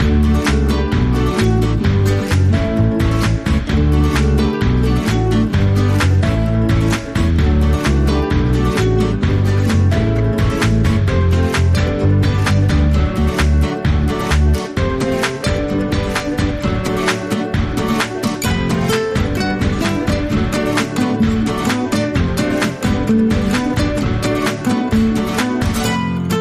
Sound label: Bike Trip, No Whistle